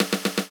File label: Snare Roll, 120bpm; Big Fish Audio; Old Skool House; Construction Kits; House of Amnesia